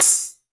Tamb, Tambourines; Roland BK-5; Percussion

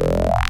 Spectral Growl, wavetables; Spectral Growl Wavetables Vol 1

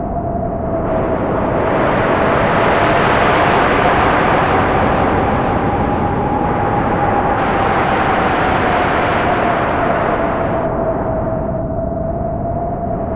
stereo wind; Zero-G Ambient 1; STEREO WINDS

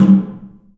drum; The Piano; InVision Interactive

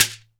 SHEKERE, Synclavier Percussion; World & Orchestral; ILIO

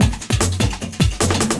LOOP, 150 BPM

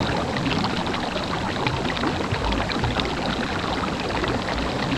SUBMERGED, WATERCOLORS; Spectrasonic Distorted Reality 1

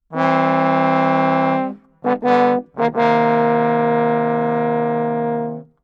85 CmajCmin low part, Brass; 90 s Sample CDs; PowerFX - Downtown Orchestra

